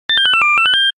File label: Pickup; Yume Nikki